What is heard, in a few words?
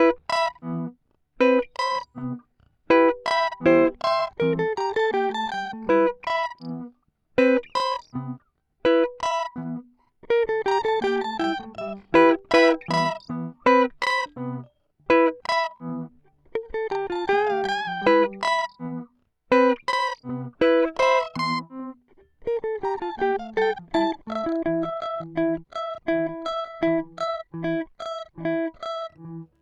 Time Pitch; Amplitube X